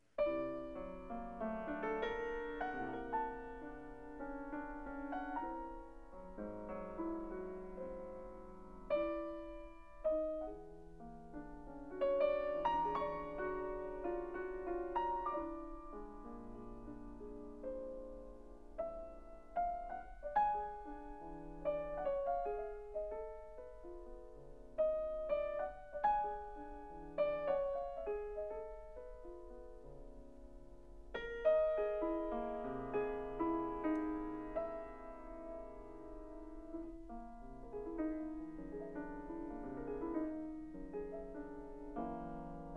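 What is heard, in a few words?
Poèmes for piano, Allegretto (3/4); Scriabin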